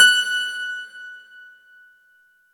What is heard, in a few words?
F#5, synth; 90 s Sample CDs; NiceBeats; SynthLine VOL-26